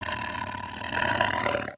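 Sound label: Sabertooth Idle; creatures; pets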